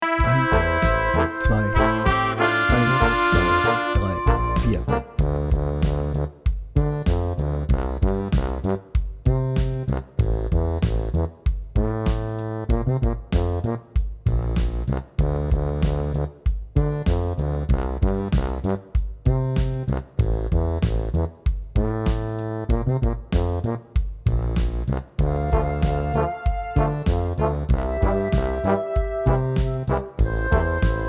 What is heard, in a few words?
Solo Part, Tempo 100; Brass Online – Grande Fanfare